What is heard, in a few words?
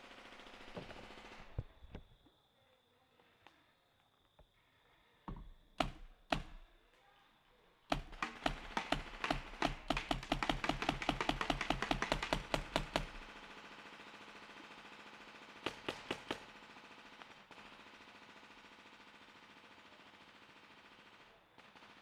paintball hits misc, splats